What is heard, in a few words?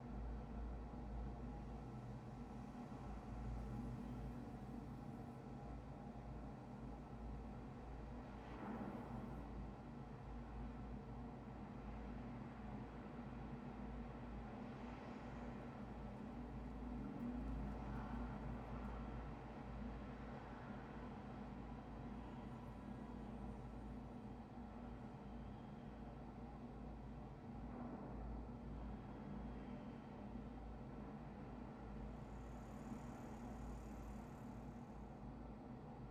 amb mine